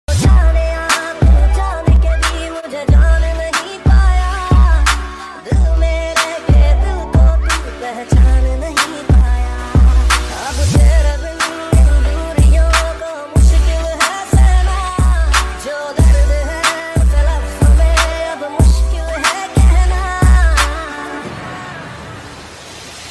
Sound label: romantic ringtone